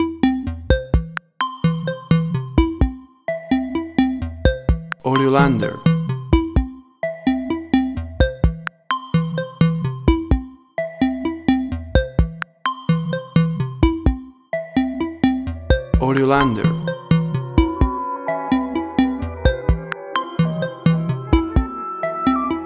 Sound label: Electropia 128bpm